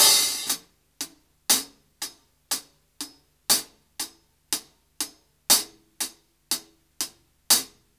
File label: Ballad Loop, Drum, 70s Ballad; Drum Tools